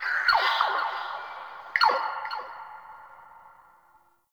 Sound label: Swamp Mid Creature; Ambience; Midrath Island